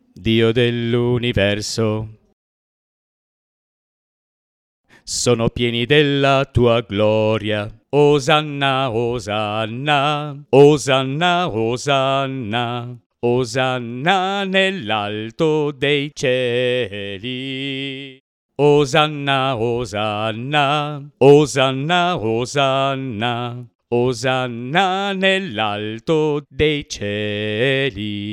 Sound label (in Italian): bassi santo